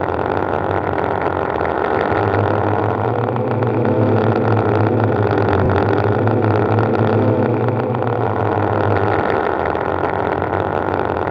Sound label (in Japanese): buuu; 男系 霊 ６; 霊がいる空間 ヴヴヴ ノイズと霊声 ループ用バックサウンド; ホラーボイス・声ネタ・効果音・ＢＧＭ